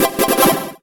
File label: Music Effect Solo Chip Arp Positive Stereo; Smashy Road Wanted 2 android ios switch gamerip 2020